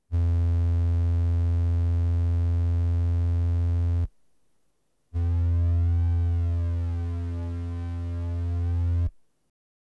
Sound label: Flanger